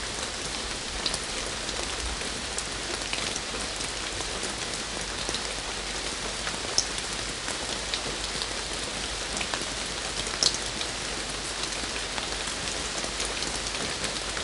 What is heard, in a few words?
rain out; CLANNAD FULL VOICE; Key